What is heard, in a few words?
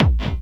hard bass drumz